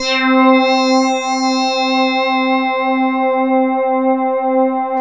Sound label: SYN, SYN Analog